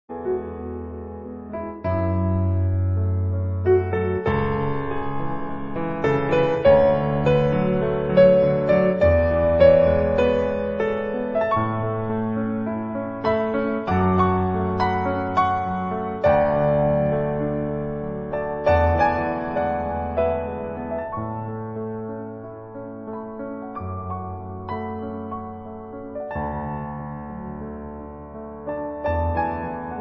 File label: Live Piano Concert; Commando